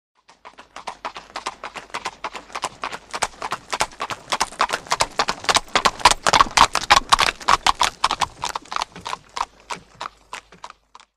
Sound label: By Horse Carriage Up P